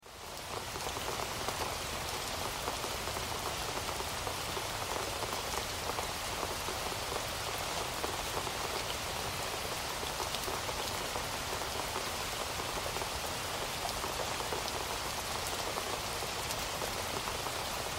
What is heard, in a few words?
Rain in a rainforest ambience